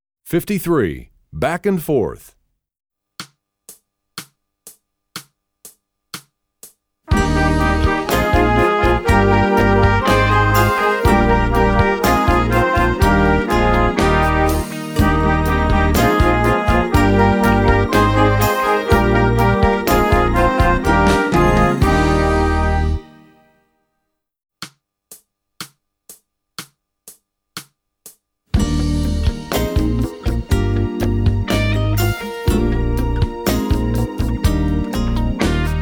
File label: Duet, trombone; essential elements